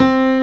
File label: wave 58; direct sound samples